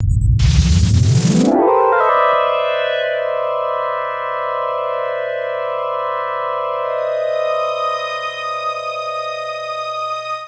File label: Ambient FX, Ambience FX